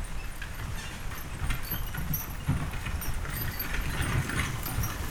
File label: Horse Drawn Wagon By; Electa Quinney